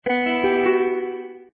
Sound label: lose